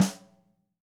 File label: Ludwig Supraphonic Snare mp Room; Sonic Foundry (Sony Creative Software) - Vital Drums